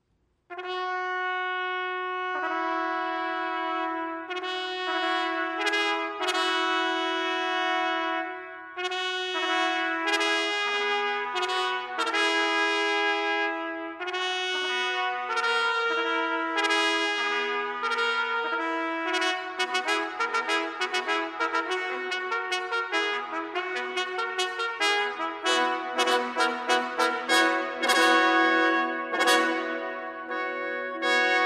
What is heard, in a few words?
fanfare, brass